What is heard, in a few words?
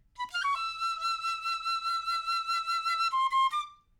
piccolo